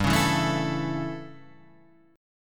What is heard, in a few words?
A Major G; A/G Chord {3 4 2 2 5 5} strummed